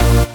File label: Synth Shot A#; Mutekki Media; Vengeance Electroshock Vol.2